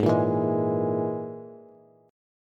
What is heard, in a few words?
Ab Suspended 2nd Sharp 5th Ab Bass; strummed; JGuitar